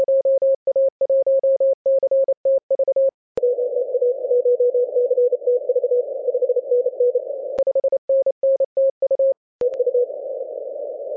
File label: 10mhz